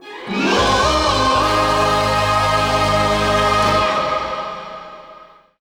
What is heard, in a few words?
Goal Fanfare; crash bandicoot series sound box; La Brea Car Pits